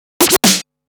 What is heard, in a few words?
Scratch Fills 140BPM